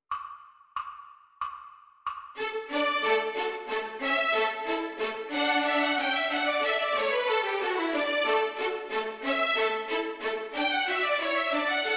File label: Backing Track; Hook; Allegro from Sonatina in F; ViolinSchool